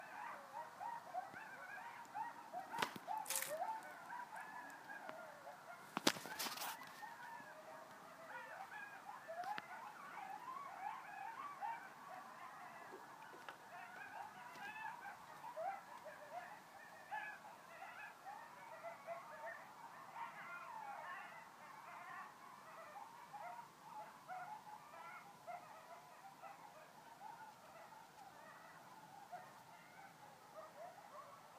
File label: Coyote Yelps